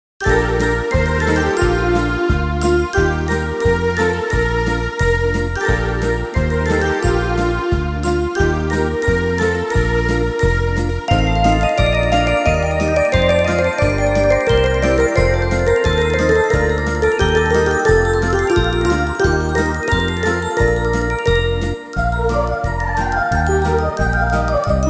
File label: Ding Dong